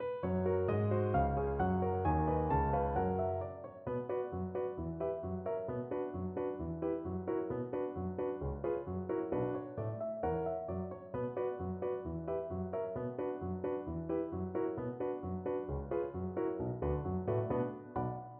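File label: VLC karaoke, Cello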